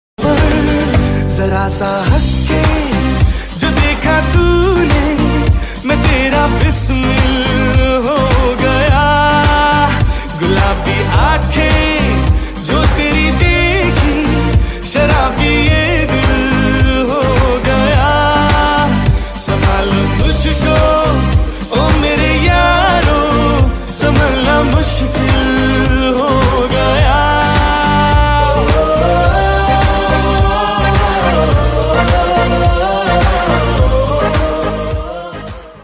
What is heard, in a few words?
love bgm